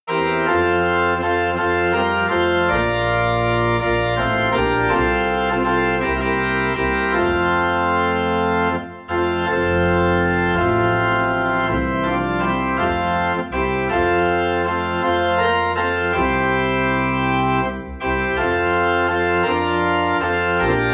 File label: Organ in F; We're Marching to Zion